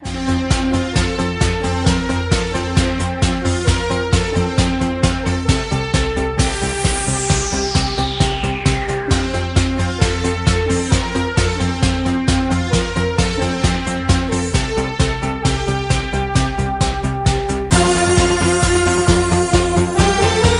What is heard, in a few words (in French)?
sonnerie